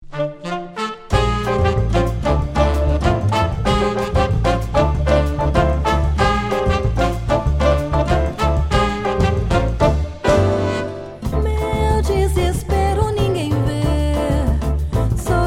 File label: quintet